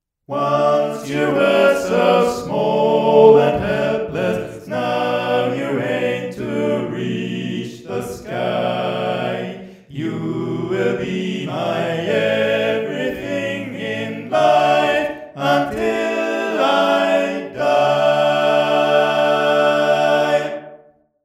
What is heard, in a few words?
Barbershop Tags, All Parts